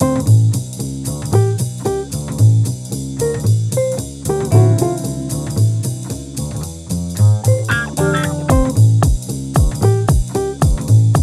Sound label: Kidsroom; Frogger in Toy Town